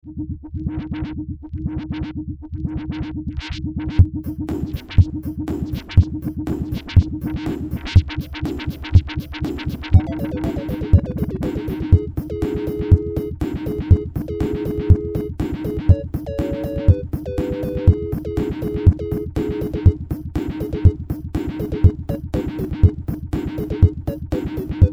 sinewave

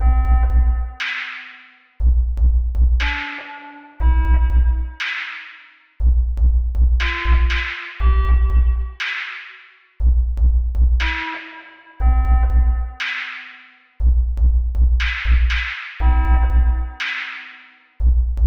Practice Beat

